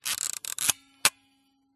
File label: smena symbol camera wind film lever; dpa4060; pmsfx